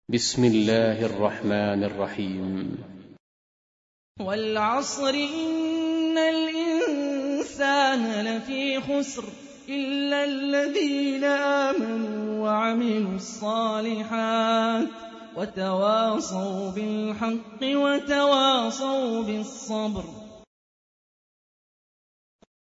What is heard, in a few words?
Quran; Hani Refaey